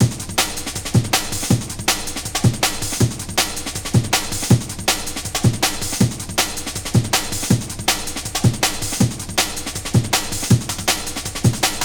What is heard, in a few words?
beat, drum & bass beats & breaks